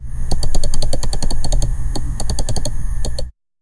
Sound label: the sound of the mouse, rodent